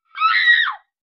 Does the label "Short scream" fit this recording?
Yes